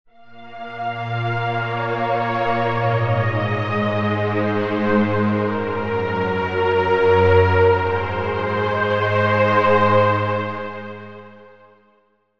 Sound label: Pad; Middle Space; Routed Sound; Vital Expansion - Star Scavenger Vol.1